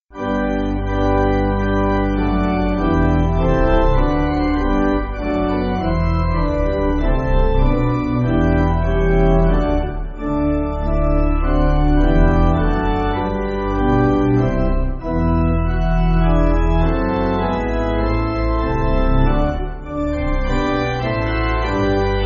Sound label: Pipe, church music; I Want The Spirit; Bickley